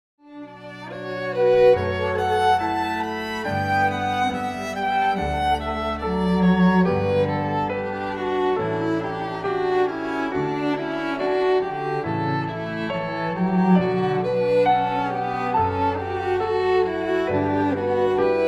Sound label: Canon in D Piano Trio